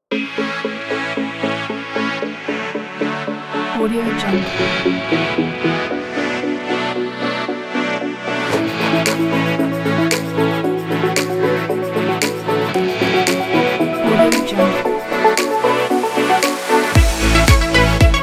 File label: uplifting pop music